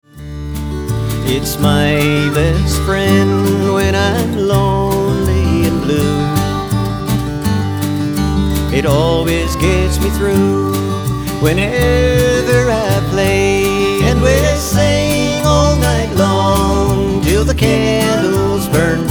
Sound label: my mothers guitar